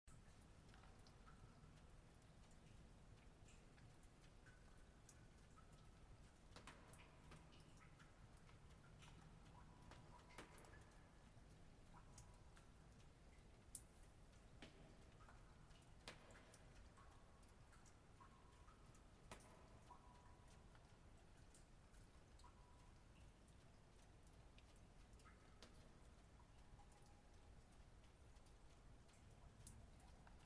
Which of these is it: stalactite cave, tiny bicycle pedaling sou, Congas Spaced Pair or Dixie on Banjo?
stalactite cave